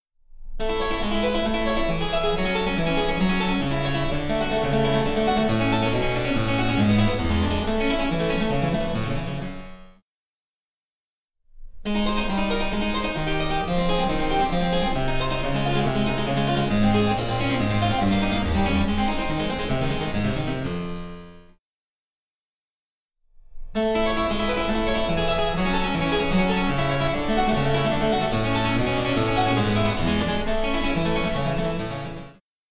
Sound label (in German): Cembalo; Mark Lindley WTC 1